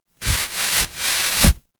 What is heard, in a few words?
paper fabric fabric